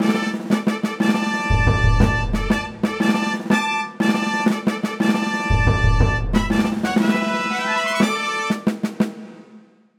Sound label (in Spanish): transicion; Mediepals